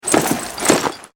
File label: foley scramble; cod4; openwarfare